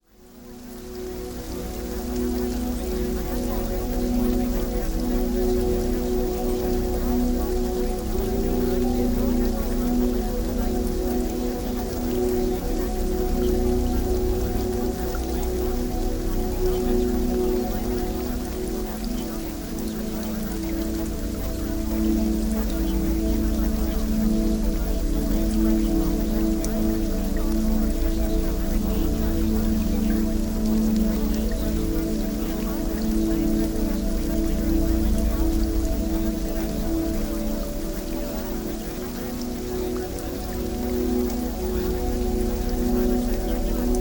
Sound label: Music